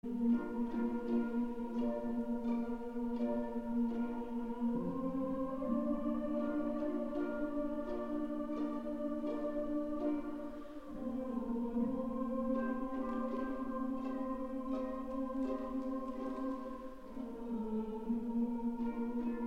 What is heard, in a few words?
Humming Chorus; Madama Butterfly, Act II